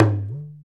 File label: PER Percussion